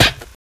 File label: concrete, footsteps; player; source engine; left 4 dead 2; clown